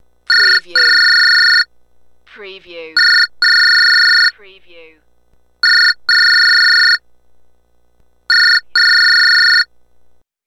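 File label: Telephone 4 Rings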